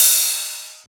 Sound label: Ride; FLPRO